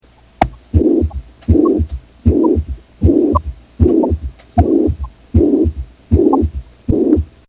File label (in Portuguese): batimento